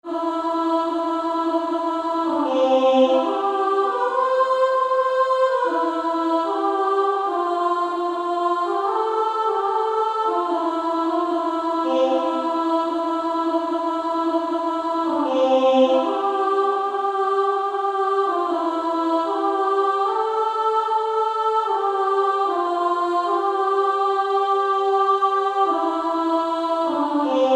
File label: Female Choir